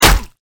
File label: hit arg